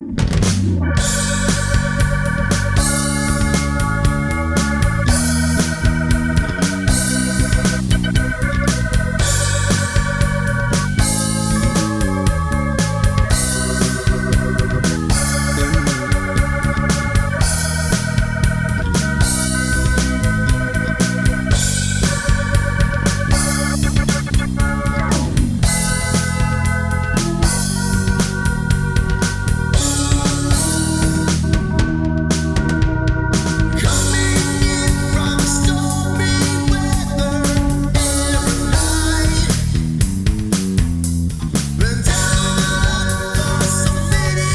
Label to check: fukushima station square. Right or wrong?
Wrong